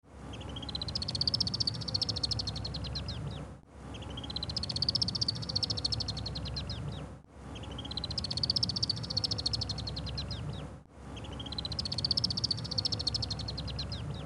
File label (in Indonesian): burung alpine swift atau apus melba